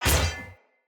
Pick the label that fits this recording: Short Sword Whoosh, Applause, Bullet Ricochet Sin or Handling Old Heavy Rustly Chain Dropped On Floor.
Short Sword Whoosh